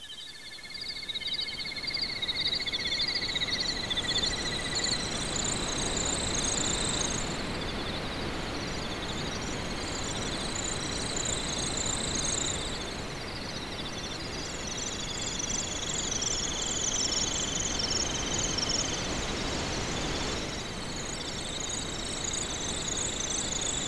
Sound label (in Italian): musica sinfonica; symphonic